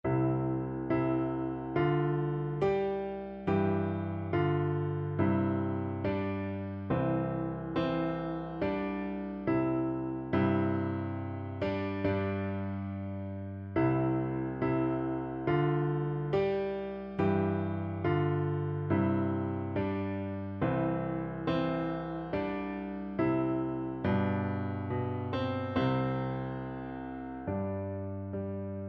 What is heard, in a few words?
ode, piano duet